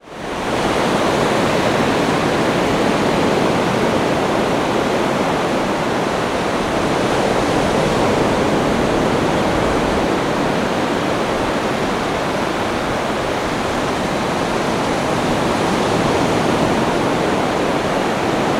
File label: nature ocean waves heavy surf 20m distance; zapsplat